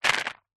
household jewelry earings new on card several short movement shake